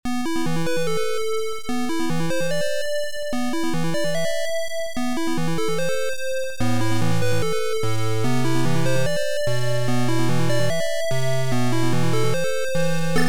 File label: Pluck